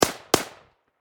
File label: Warfare Machine Gun 7.62 Caliber Ak 47 Double Shot Distant Perspective